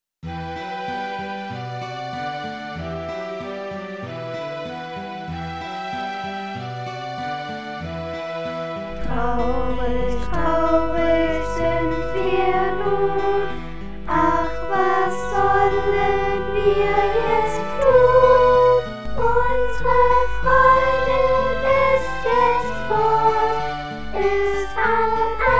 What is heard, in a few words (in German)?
traurig Chor